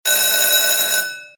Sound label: RING LONG; Helena